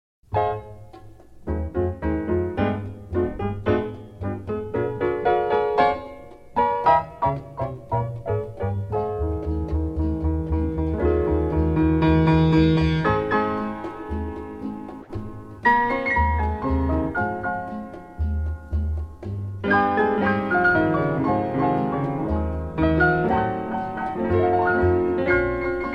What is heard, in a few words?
Piano and Orch